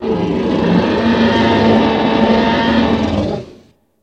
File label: Dinosaur Roar Push Mo